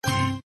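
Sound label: button click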